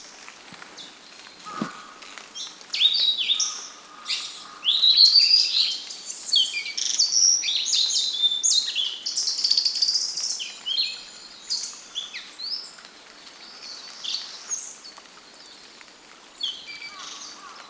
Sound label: Blue-and-white Flycatcher Cyanoptila cyanomelana